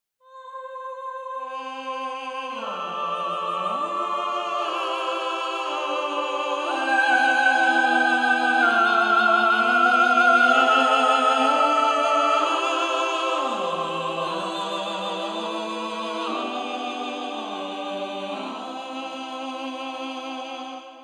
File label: counterpoint; Artinfuser Counterpoint